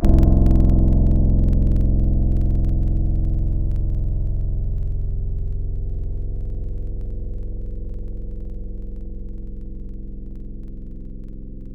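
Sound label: keyboard PIano Mid, Wavetables; Synthetic's Serum Collection; Standalone-Music SYMPHONY Wavetables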